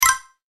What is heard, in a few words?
Pick Up Tokens Game Sound; Video Game Sound Effects; electronic sound effect